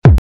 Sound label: kick